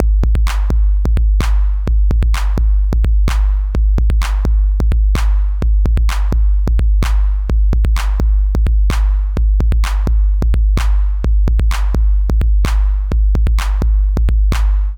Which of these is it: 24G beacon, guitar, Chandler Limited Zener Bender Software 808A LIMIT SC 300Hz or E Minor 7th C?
Chandler Limited Zener Bender Software 808A LIMIT SC 300Hz